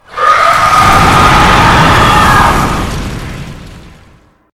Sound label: death; zombiesurvival; medieval raid; special mutation; arkantos